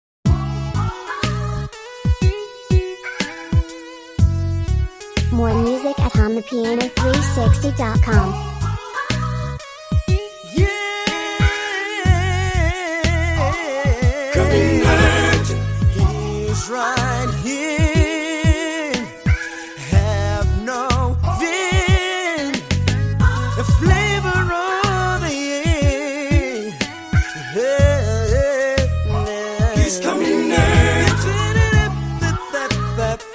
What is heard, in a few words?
kwaito